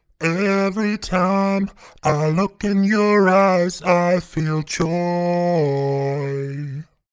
Vocals Pitch And Throat Min 3rd Down; Antares

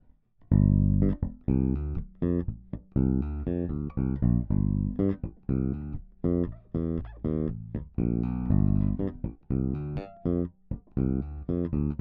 passiv Both; Ibanez

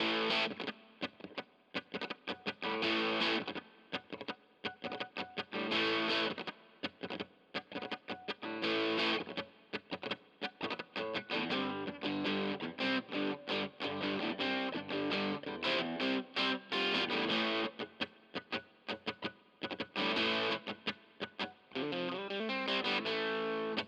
Harley Benton DC-60 Junior Fuzz

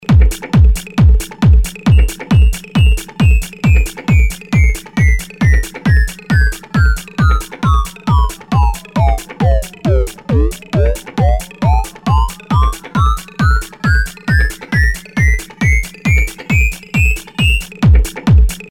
RING STORM

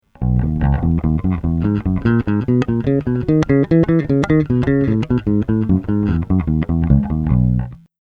Fast Treble

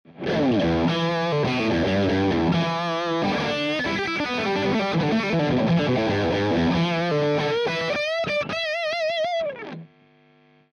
Lead Torpedo Orange 2x12

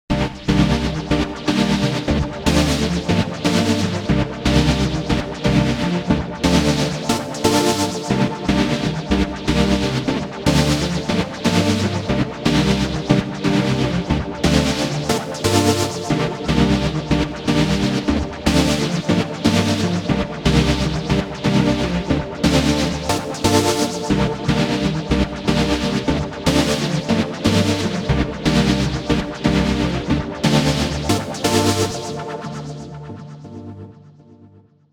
Housy Psychic Echoes

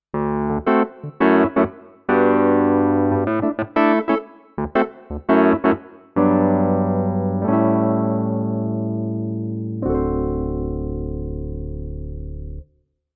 Studiologic Numa X GT E Piano Wurly

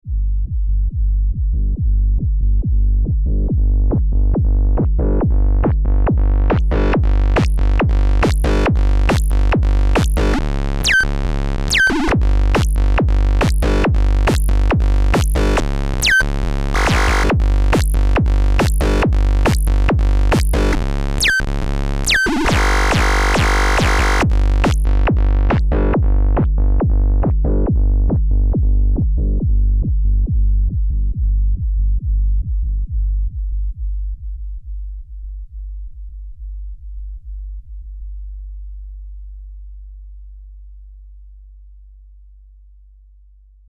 BD Bass SEQ Pitch Mod Modulation; Moog DFAM

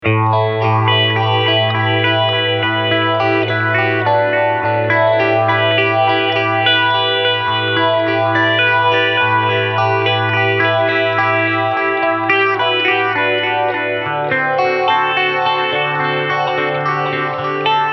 Boss Katana 50 MKII Preset Clean Phaser Delay

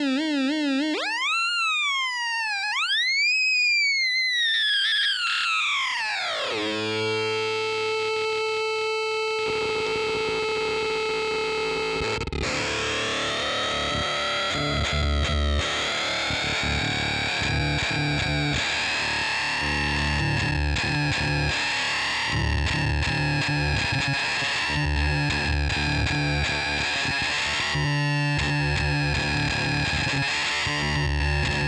Industrialectric 4046 m Arpeggiator

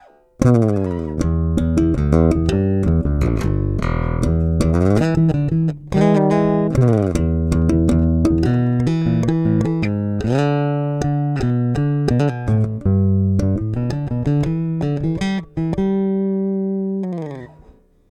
Bridge active neutral

